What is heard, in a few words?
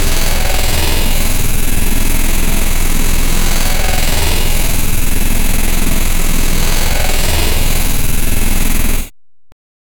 high impact; vfx